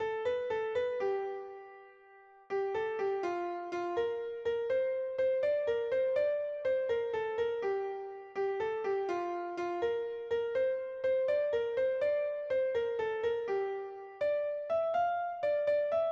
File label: piano; branle